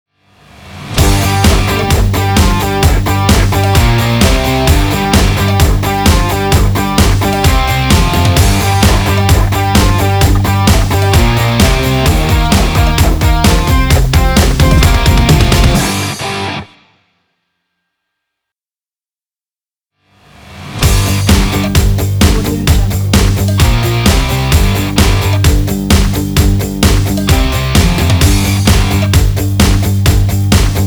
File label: rock logo; sergioproductions